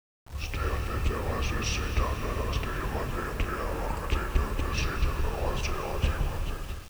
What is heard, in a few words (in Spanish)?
susurros